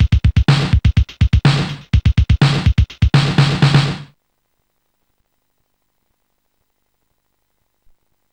rhythm; nineteen rhythm; Sonix Music Driver

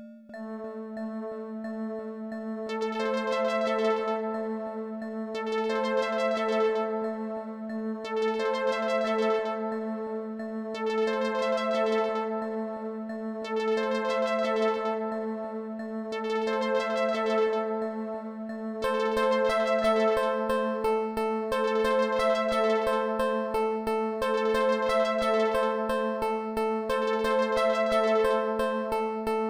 drum, ORG; Deluxe Music Construction Set

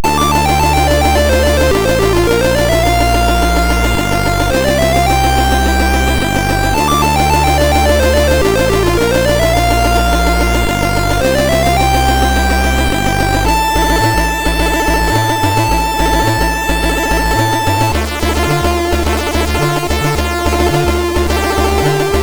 sid MOS6581R4; Tiger Mission

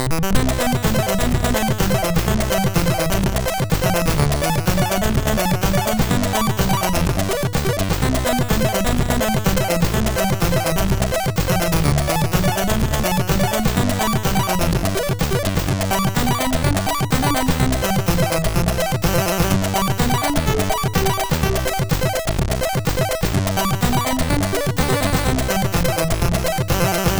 sid MOS6581R3; Snake Or Die

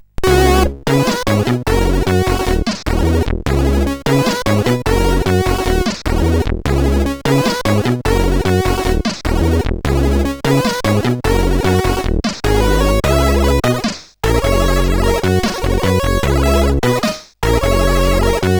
Drunk, sid CSG8580R5

